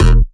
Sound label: Bass Shot E